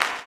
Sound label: CLAP, blues & soul; ILIO; Vocal Planet